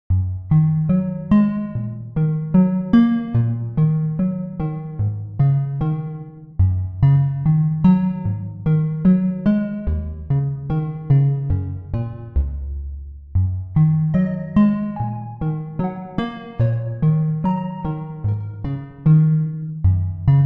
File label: gameover; PinmikPanik; bgm